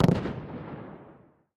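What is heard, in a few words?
distant training shot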